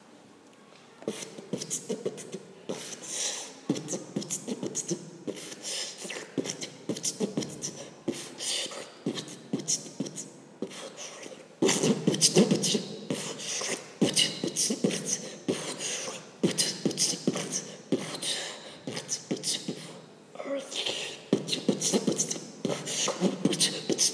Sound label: beatbox